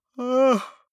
yawn male vocal short; koerpergeraeusche; menschen; soundpool

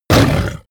dragon hit; kreaturen

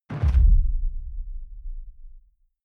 sci-fi underwater shot soft

